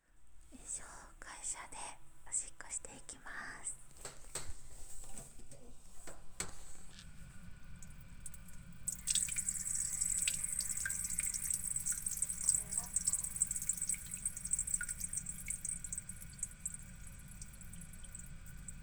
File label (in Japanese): 会社のトイレでオシッコ; ２回目！(トイペ無くなって新しいのセット)